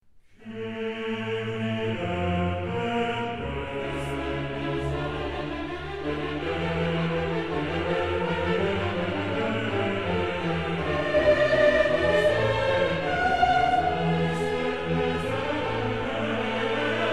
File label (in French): kyrie entrees